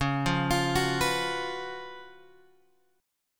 C sharp 11th C sharp; C#11 Chord {9 8 X 10 7 7} arpeggiated

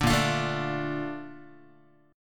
A sharp Major 7th, Guitar; A#M7; strummed; JGuitar

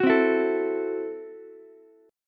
Gb Minor 7th Flat 5th, Ukulele; strummed; JGuitar

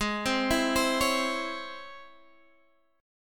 C sharp Minor Major 7th, chord

